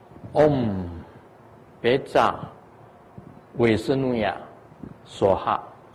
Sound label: Vishnu Mantra